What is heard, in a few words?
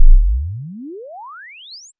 Equal Loud